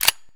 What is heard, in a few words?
kedr slider up; Escape from Tarkov